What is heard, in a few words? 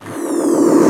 Sweeps Short